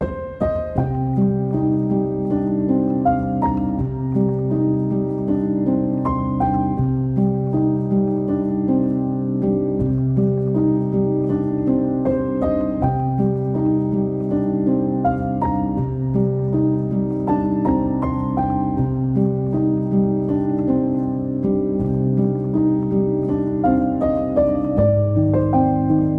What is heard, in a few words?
Piano